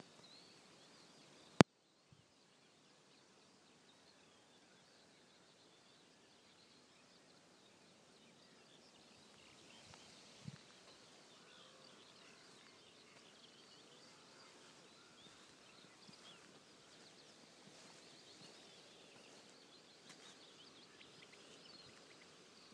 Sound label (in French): oiseaux